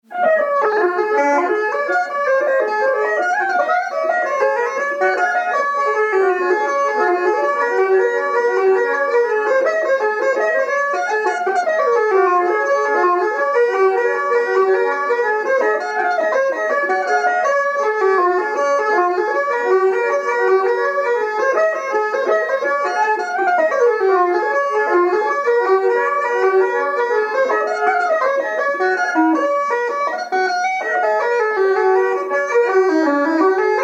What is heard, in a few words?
hornpipe; Alexanders; OCallahans